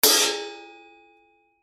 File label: Cymbal Suspended; Musical